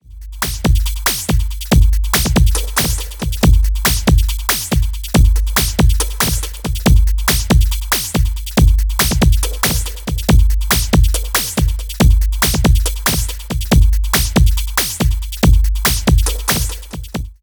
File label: Triaz Motion Sequenced; Wave Alchemy